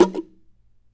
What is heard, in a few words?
NOISE, guitar